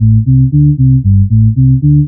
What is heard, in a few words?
bass original